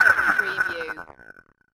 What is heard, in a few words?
Space Sonar Distort